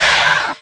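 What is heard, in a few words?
MONSTER INSECT DAMAGE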